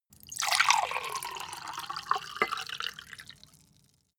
glass pour clank; soundpool

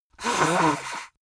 nose blow big; soundpool

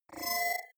bubble tonal, sweeps swells; comedy-cartoon

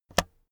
keyboard external space release, computer; kommunikation

